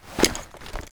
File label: suit, footsteps